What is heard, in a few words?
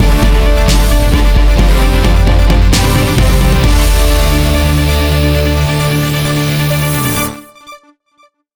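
Win Stinger Electronic; Win Stinger Electroninc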